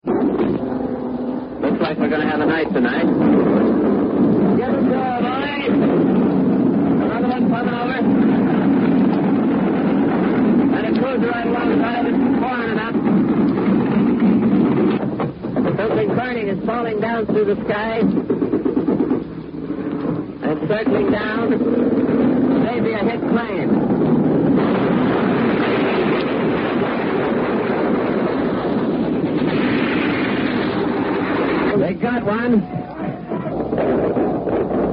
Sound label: radio news nighttime convoy anti-aircraft attack; 1944